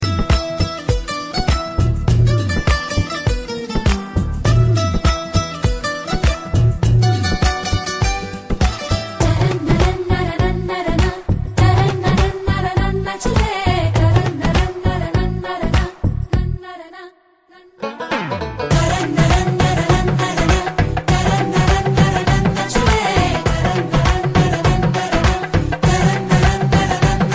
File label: BGM Telugu Ringtone